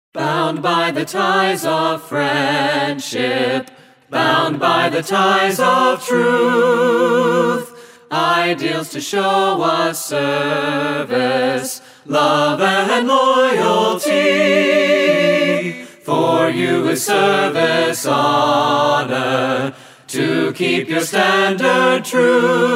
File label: Brothers Are We Acappella